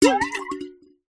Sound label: bottle metal drink containing water bash against table; food drink; zapsplat